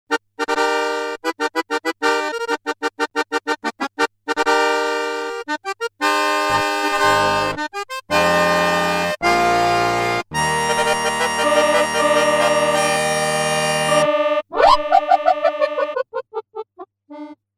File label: broken fanfare